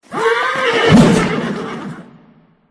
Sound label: Soldier Horse; the great ottomans strategy battle for throne android